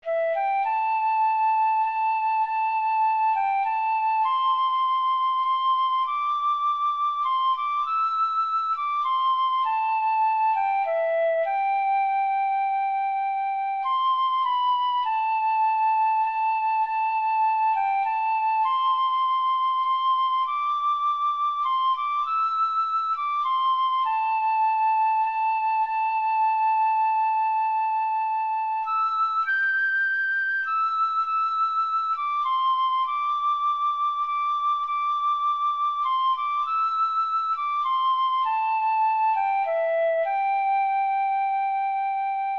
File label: tin whistle